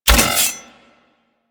Spin Attack Hit; Warframe; Corpus; Arachnoid Micro; Abilities

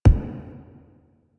Button Press; Warframe; Quests; The New War; UI; Struggle Prompt

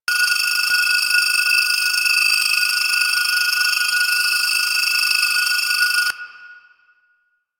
Prism Laser Loop; Warframe; Warframes; Harlequin

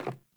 Sandals Run Wood, Footsteps

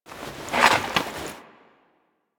Hunting Bow Load; Foley; Warframe

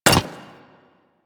Hyena Micro Step Default, Footsteps; Warframe

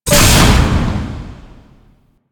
standard door open; ambience; Warframe; Entrati

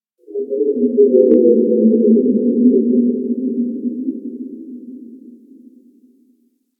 Gas City Exterior Low Mid Space Texture; Ambience; Warframe; Corpus Gas City

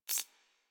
Briefcase Akimbo Pistol Aim; Warframe; Weapons; Corpus

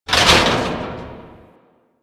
military checkpoint metal hit; Warframe; Nineteen Ninety Nine

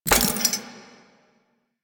Scythe Mech; Melee; Archwing; Warframe; Weapons; Exalted Archwing Scythe

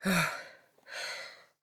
Downed Breathe; Enemy; Lady Deora; Battle Barks

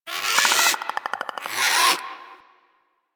Landscape Close Critter, Ambience; Warframe; Venus Open World; One Offs

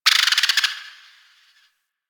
Forest Distant Medium Creature Tree Small Call

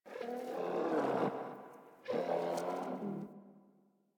Landscape Mid Creatures, Ambience; Warframe; Venus Open World; One Offs

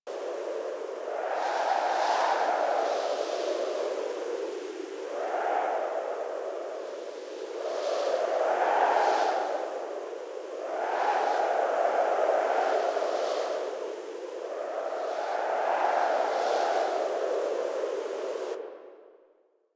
Exterior Wind Bed Loop; Warframe; Sentient Devourer

